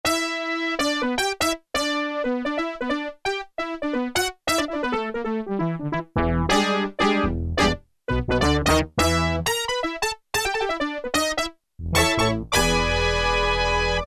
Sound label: BRASS QUART, Casio VZ-10M; Internal factory combi